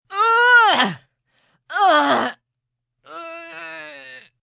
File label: Grunt Voiced Push, Grunt, groan, lifting, strain, female, with discomfort; (human voice); VoiceBox